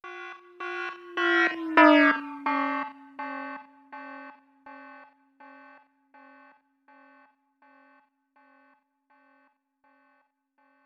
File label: Alarm, doppler shifted ringtones iphone